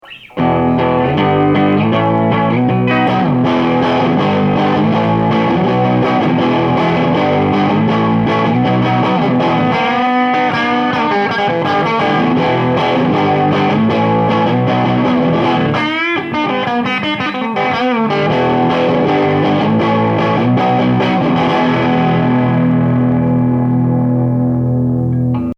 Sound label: fuz low loose